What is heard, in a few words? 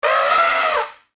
new elephant die; Eft